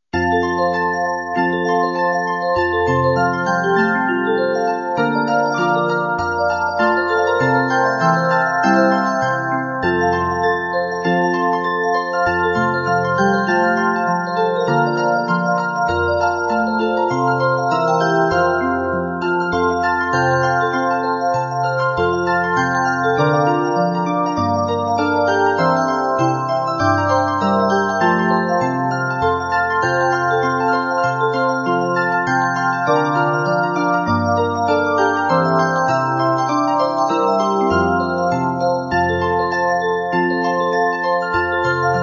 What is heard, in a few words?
Jingle